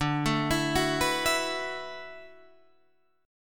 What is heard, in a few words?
D 6th Add 9th D, Guitar, Open D